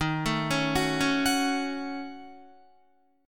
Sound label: Eb Minor 7th Flat 5th, Guitar; arpeggiated